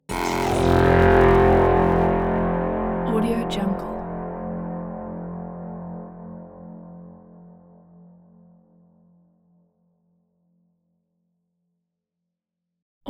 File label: hybrid trailer horn of doom braaam